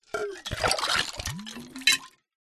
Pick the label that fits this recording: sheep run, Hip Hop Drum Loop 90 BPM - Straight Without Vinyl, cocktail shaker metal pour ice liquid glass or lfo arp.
cocktail shaker metal pour ice liquid glass